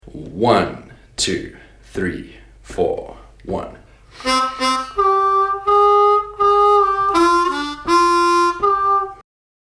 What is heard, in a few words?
Lick, harmonica